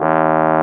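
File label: brass bass trombone; BRS BASSBO1M; Roland L-CDX-03 Disk 2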